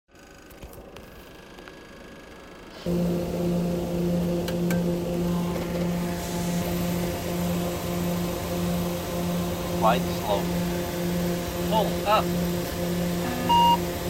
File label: plane